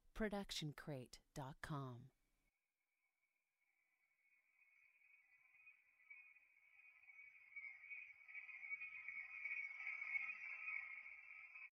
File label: reverse shimmer no tail; soundscrate